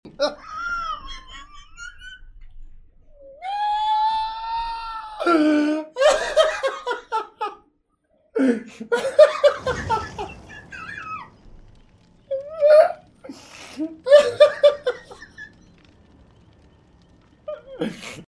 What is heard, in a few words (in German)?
lachanfall kurz